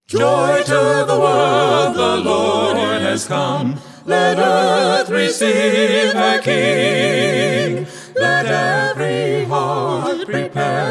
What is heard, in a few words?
Carolers; Las Vegas; christmas